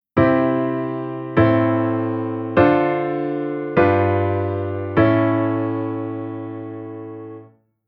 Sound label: Chord Modulation V7